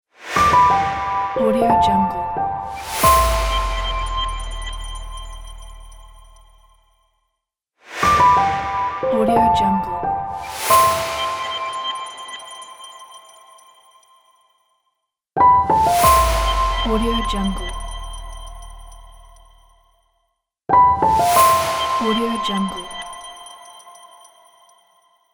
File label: calm piano logo